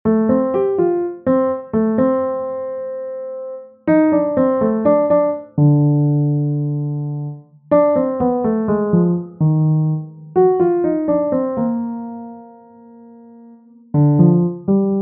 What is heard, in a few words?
bossa nova